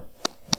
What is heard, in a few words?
click